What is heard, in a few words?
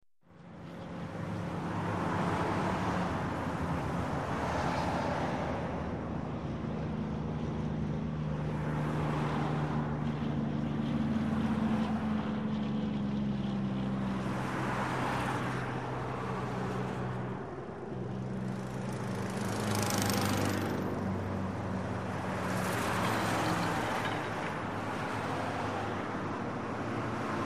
Highway Traffic, Busy; Close Perspective Cars And Trucks.